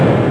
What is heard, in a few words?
attack repelled; Empire Master 2.6 Demo